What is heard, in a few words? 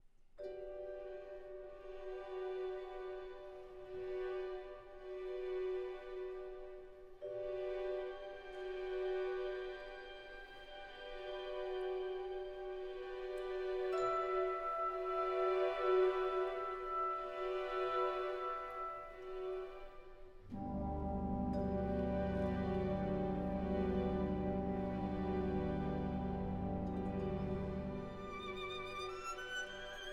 Violin Concerto in D Major Romance Andante